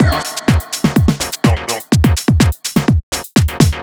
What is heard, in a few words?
Techhouse Kit; Rave